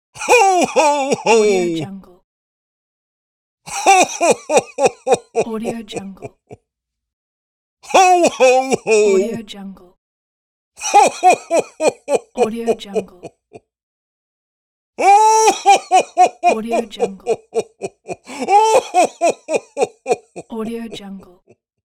santa claus ho ho ho

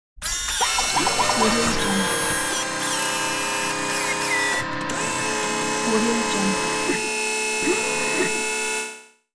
servo mechanism

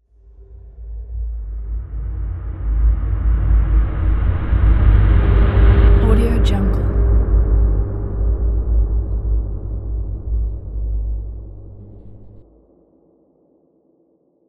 gentle slow whoosh

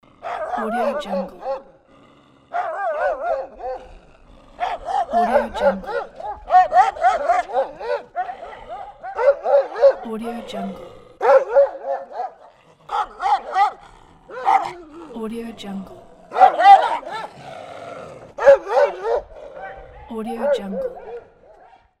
angry dogs bark

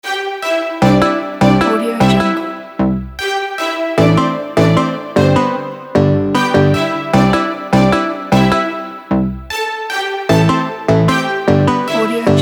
bright modern trap; shinybirds

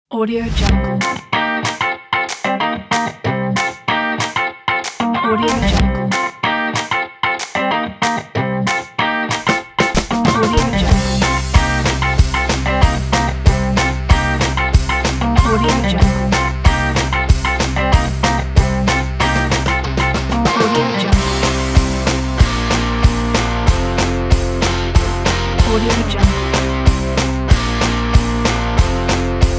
rocknroll

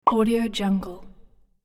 mouth pop plop

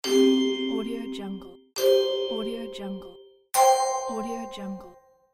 scatter icon

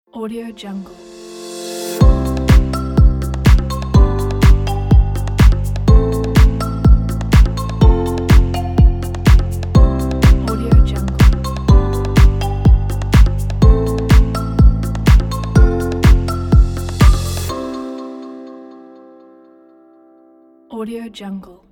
happy inspiring logo

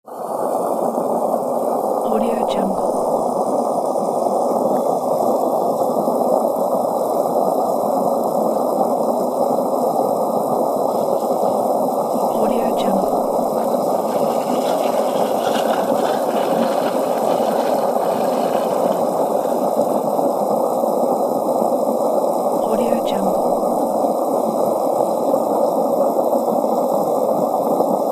gas filling up steady

